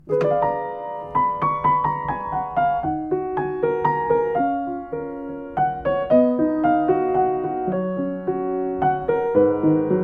Yamaha Fuji, piano

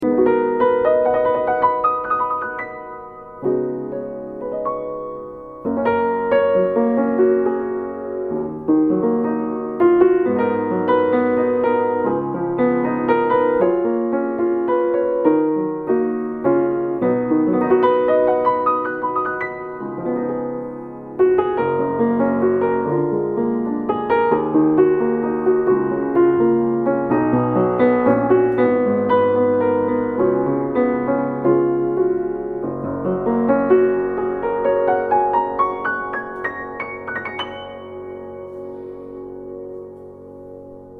Eterna, piano

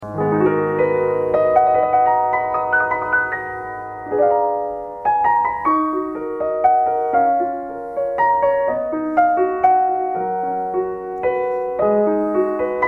Bechstein Academy A116, piano

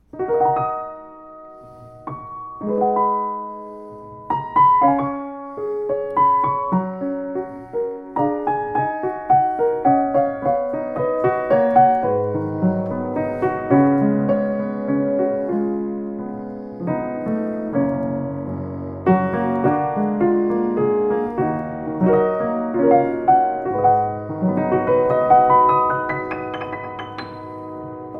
Wilh. Grotrian 116, piano

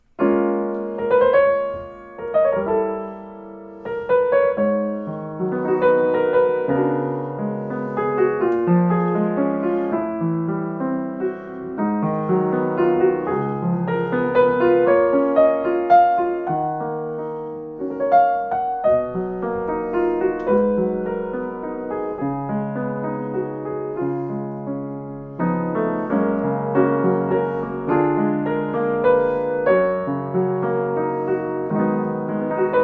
Kawai US 55, piano

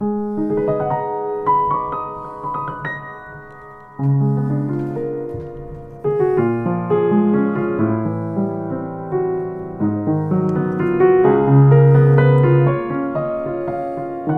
Eterna ER10, piano; Eterna ER 10 by Yamaha

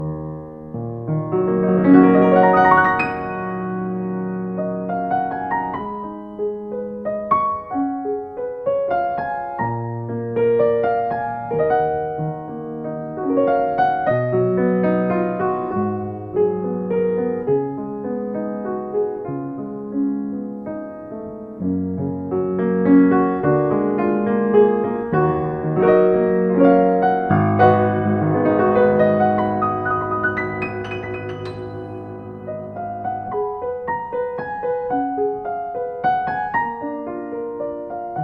Schimmel 174T, piano

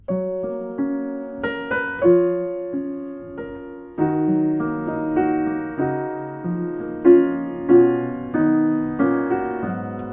Samick JS121, piano

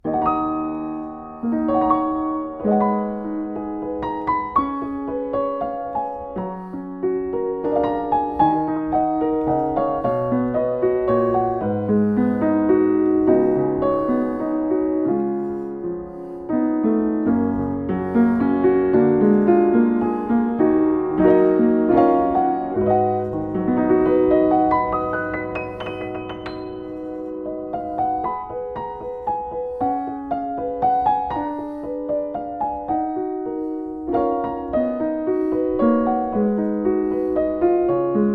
Ferd Hoffstein UP108T2, piano

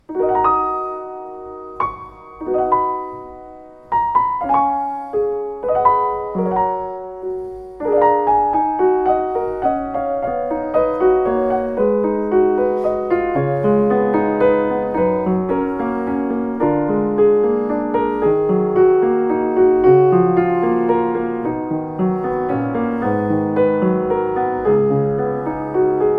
Kawai E200, piano

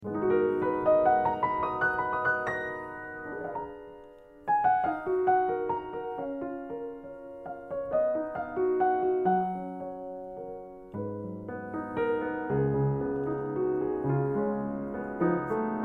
Schlogl 118 Moderna, piano

